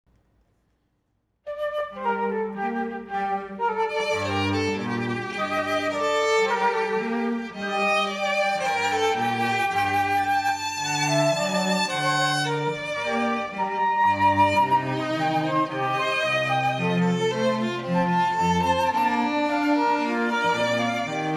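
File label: Sonata G minor (Flute Trio); Vivaldi; Cantilena South Florida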